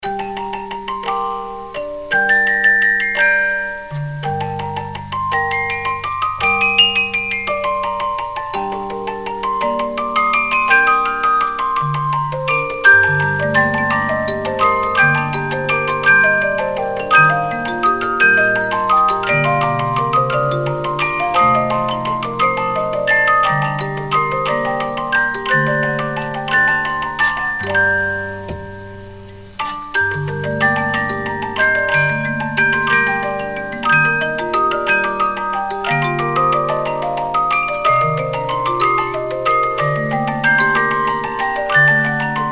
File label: Die Moldau 3 Parts, 50-Note Movements